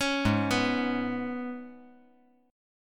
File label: G sharp Minor Double Flat 5th G sharp; arpeggiated; JGuitar